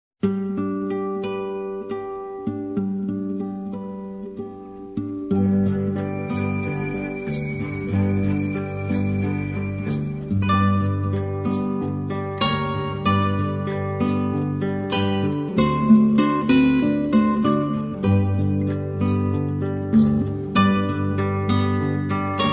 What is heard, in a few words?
Guitar and Orchestra